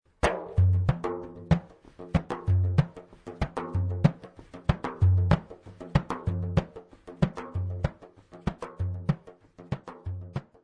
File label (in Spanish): PANDERO